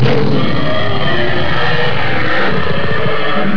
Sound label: desmatosuchus